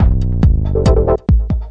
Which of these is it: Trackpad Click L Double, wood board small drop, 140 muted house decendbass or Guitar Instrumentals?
140 muted house decendbass